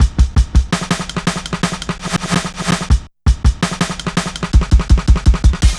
Shuffling Live, Jungle Drum Loops; Zero-G Jungle Warfare 1, 2 & 3; 90 s Sample CDs

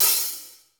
hat, funk kits; Crypton - DrumBase5000

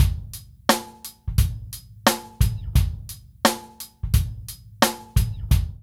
LOOP

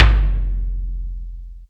tom, Yamaha TG-500

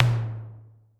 perc, Drum Machines, Clavia Nord-Drum

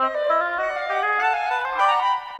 Winds, Orchestral; Sonic Foundry (Sony Creative Software); Orchestral VOL-2; Modern 18

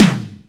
drum, drumcomputer EMU SP 12; Best Service; ProSamples vol.55; Retro Sampler; EMU SP 12+1200

